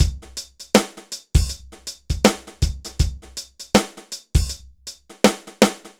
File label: dry Loop BPM 80 Dry, AKAI S6000